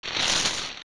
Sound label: Crawl; Gohma; Zelda; OOT